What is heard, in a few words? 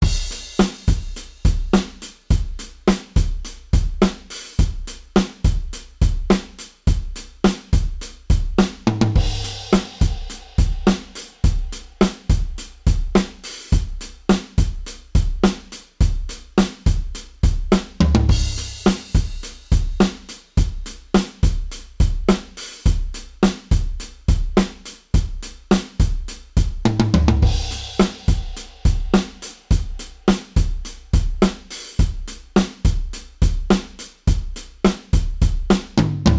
modal surf, drums